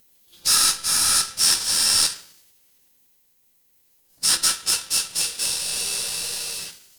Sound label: fire electric zap